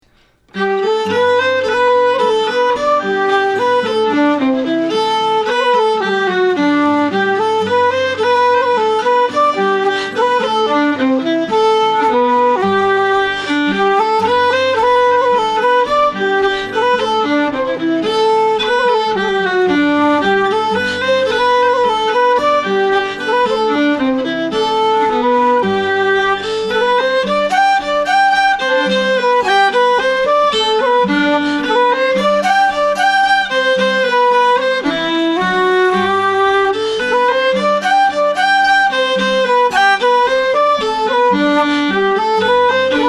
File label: Tune, celtic band